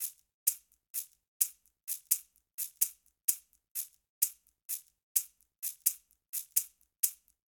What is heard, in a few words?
Latin Fusion Shaker 128bpm; Latin Percussion; Ableton; Factory Packs